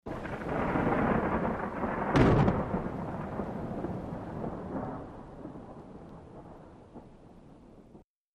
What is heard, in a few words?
Rolling Thunder